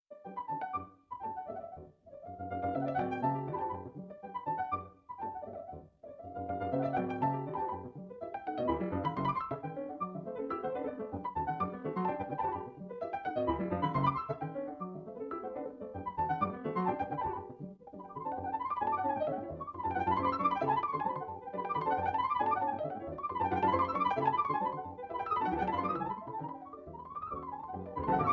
Andante And Variations For Piano, Four Hands In G Major